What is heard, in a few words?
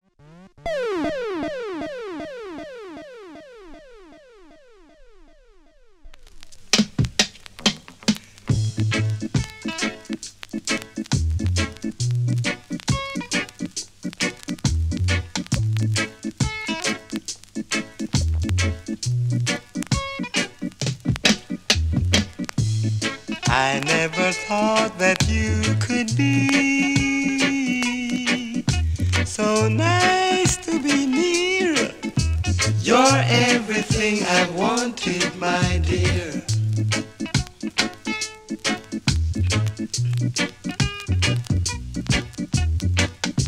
Reggae Harmonies for the Heart